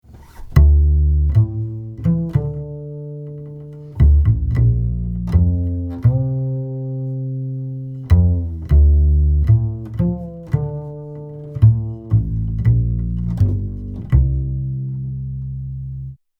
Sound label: Double Bass comp 12 db; Chandler TG-1